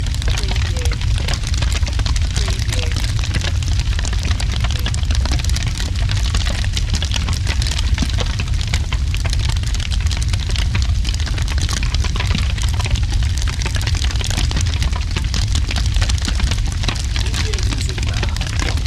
Fire Flames Heavy

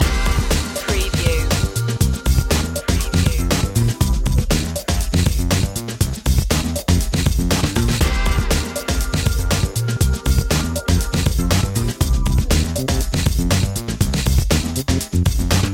fatboy blues loop

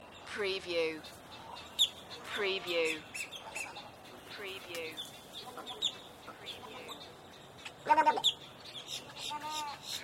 Marsh Ambience Florida Evening Loop

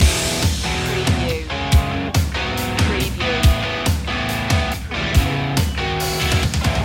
the race loop

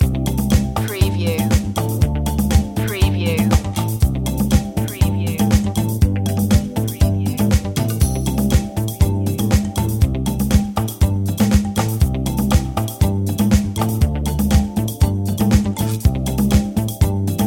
cafe noir loop

